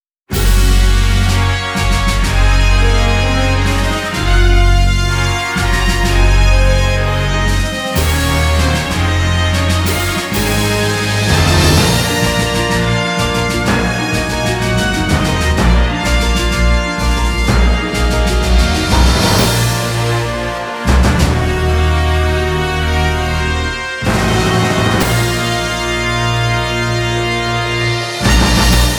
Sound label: Fanfare St. Moritz